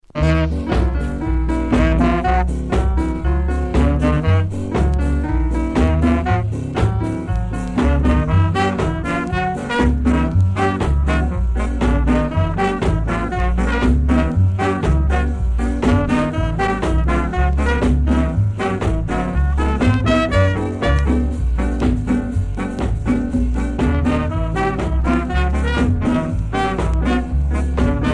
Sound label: ska C